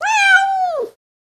Cat Frustrated Meow

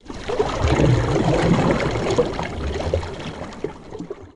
Canoe Paddle Stroke Underwater

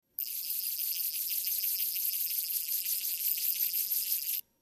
Fishing Reel Reels In, fishing pole

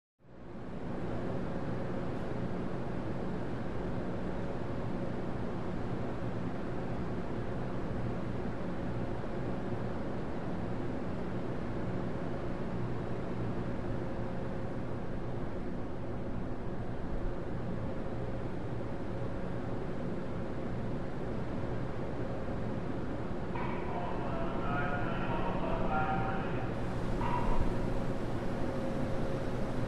Subway Platform Trai, subway

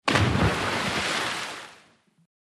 Splash Hvy Boom Splash

